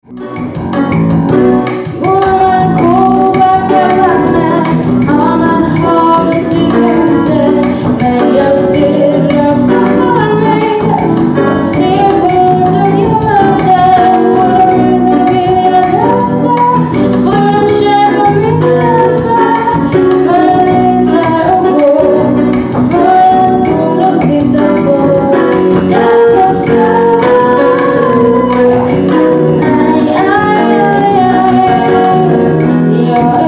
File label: Samba